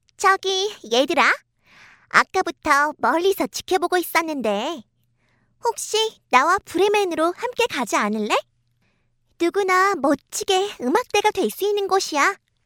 고양이

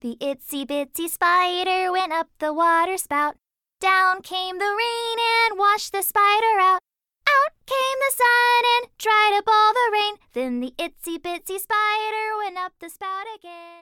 영어여 동요

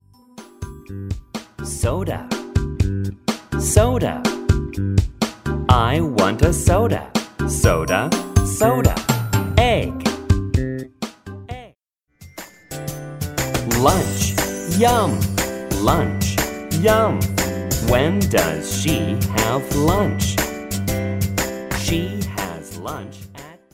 영어남 챈트

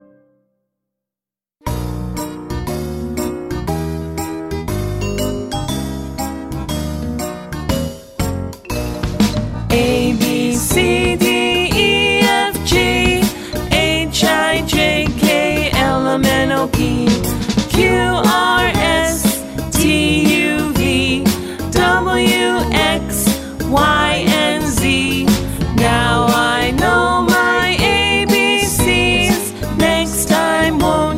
영어 여 노래 알파벳 송

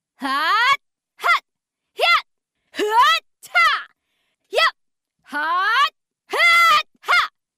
기합

